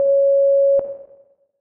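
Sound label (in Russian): короткий звуковой сигнал предупреждающий о пожаре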